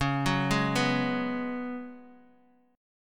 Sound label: Db 7th Suspended 4th Db down Guitar DADGAD